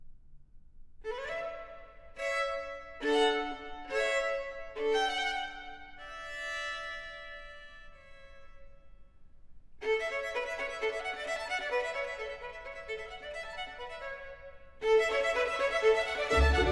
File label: Sinfonia a 4, for trumpets, violins & continuo, Adagio e spiccato; Presto